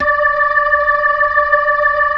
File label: B-3